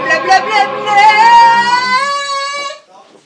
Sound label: singing along to french songs when you don't know the words